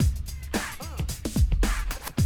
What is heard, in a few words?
110, Classic Drum Loops